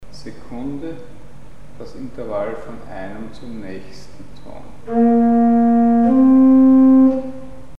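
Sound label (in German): große Sekund; Mikrobuch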